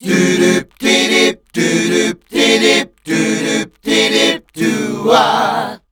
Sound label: Voice Spectral, H PHRS